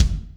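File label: tight bass drum; osu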